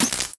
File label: kids game objects metal bot zap futuristic tap hit